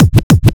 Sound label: Vinyl Scratch Various FX - 100 BPM; trap; Cymatics; Trap Starter Pack